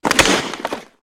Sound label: Hockey Player Body, hockey